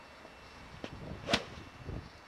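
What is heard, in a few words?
Golf swing With 3 wood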